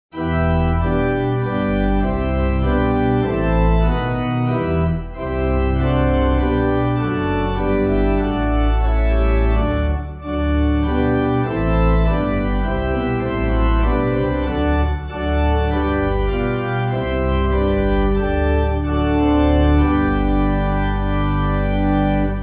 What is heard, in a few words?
Pipe Organ, Music Backing; We Sing The Praise; Breslau